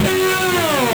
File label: Guitar Slide; Skitchin'; M8; Genesis